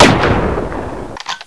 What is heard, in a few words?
m40a1 fire last; svencoop; weapons